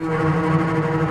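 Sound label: bass tremolo E3; Digital Sound Factory Virtuoso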